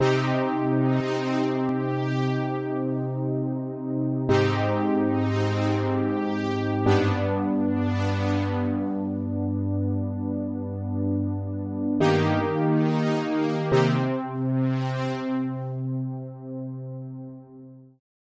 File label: lead formant spacing